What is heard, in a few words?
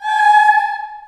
G#4 child ehs; USB Soundscan vol.28; Choir Acoustic & Synth; AKAI